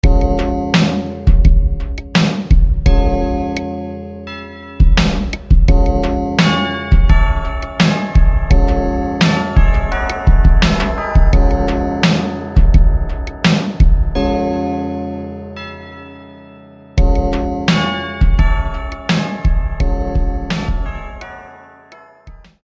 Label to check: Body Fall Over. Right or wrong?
Wrong